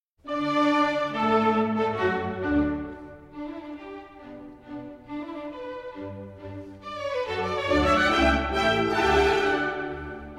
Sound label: Violin Concerto in D Major, Allegro Moderato; Mozart; Violin Concerto No. 2